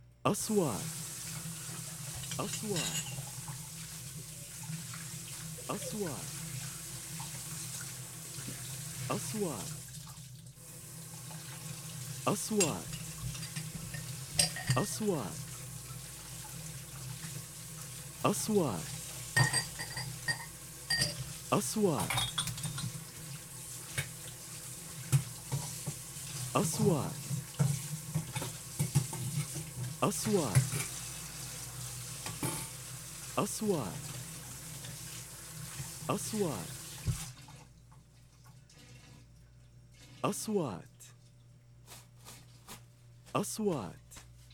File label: Washing kitchen utensils